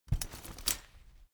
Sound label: umbrella big close full hard